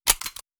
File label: glock fast reload